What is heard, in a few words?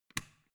switch small turn on